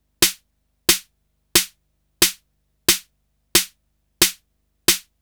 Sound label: Snare Loop; Sonic Foundry (Sony Creative Software) - Fluid Dynamics Drum & Bass; Construction Kit